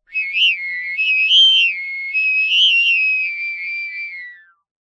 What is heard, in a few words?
foley pipe plastic tube air blow through unharmonic shrill musical tone